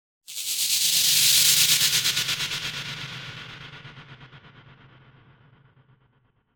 Stinger Crackle Suspenseful Sharp Thin Crackle Feedback Wave